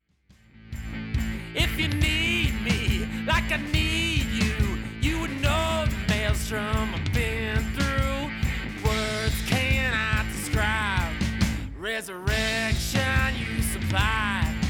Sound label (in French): novatron glue fullmix sans effet